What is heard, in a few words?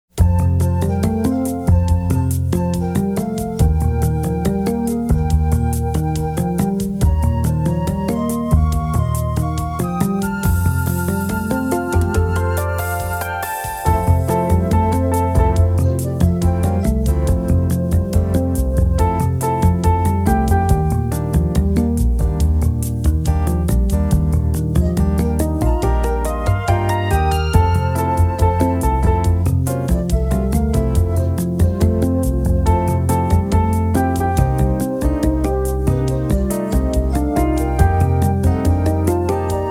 Looner Landscape WITH melody fade